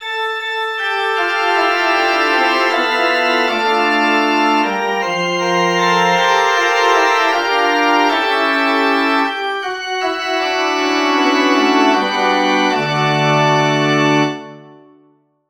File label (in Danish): forspil